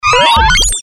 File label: options remove; CAC ASSETS